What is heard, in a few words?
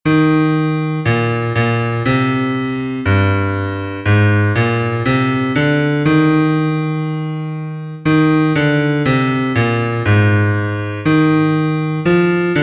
Bass